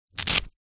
sport goalkeeper glove velcro strap pull

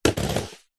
rollerblade single roll step; foley

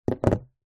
office scissors down on empty cardboard box

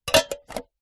tin metal small lid put on

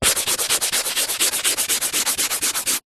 office erasing rubbing out chalk blackboard

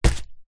footstep single barefoot stomp on cardboard

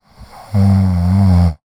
human female snore single; zapsplat

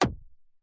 impact thud hit hard rubber

